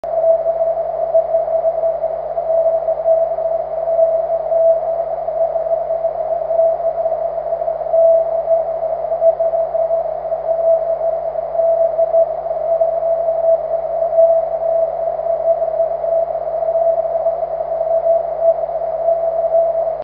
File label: cw; 23cm